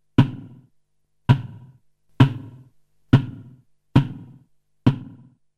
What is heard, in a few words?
Snare trapped noise